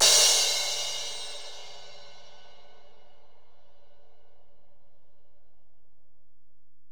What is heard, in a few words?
Ride, Alesis DM-PRO